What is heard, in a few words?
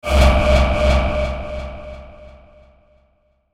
Whoosh Drum Hit Airy Delay; Blastwave; Whoosh Sound Effects